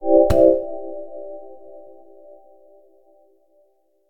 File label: Fridge Close; Night Reverie; Android; Xbox Series X/S